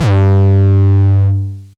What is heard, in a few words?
808 Dist - G2, Kicks; Drums; MBase 11 - 808 Dist